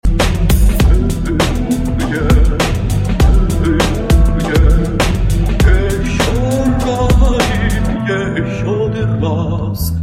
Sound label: win, music